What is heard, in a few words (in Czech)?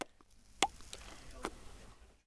doutníček; Mafie 1